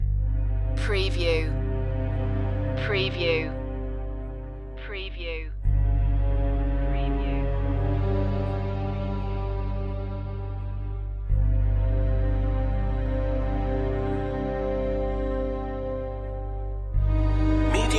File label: beneath the surface loop